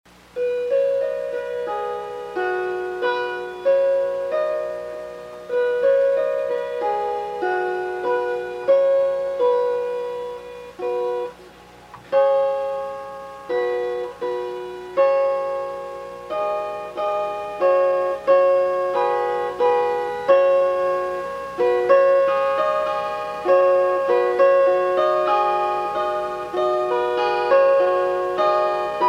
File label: Plucked, harp